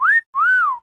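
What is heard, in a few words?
cartoon whistle sounds at the sight of a beauty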